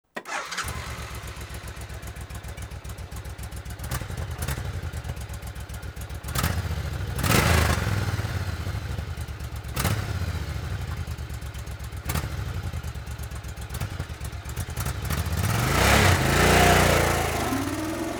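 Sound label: Suzuki VS 1500 Intruder, motorcycle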